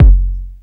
BOOMBA, 12-Bit Drums; E-MU Formula 4000 Series Vol. 1; Hip Hop Nation

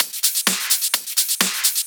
Clubhouse Loop 128 BPM

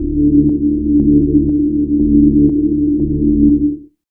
DRONE; East West - Phat & Phunky

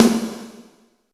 VERB SN; East West - Phat & Phunky

